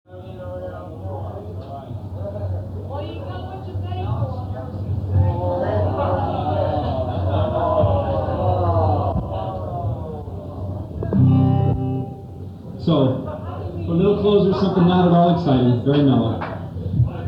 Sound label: Guitar Solo, live; Live at CBGB's 31-07-77